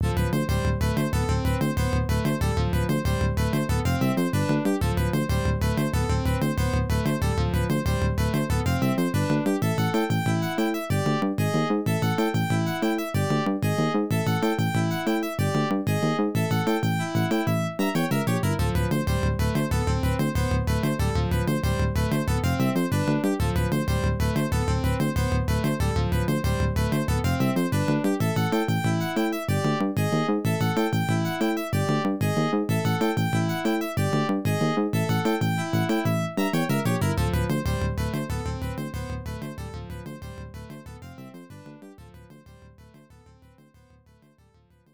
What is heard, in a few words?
Unused BGM